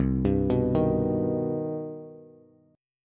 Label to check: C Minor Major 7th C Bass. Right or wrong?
Right